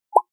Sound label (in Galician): recoller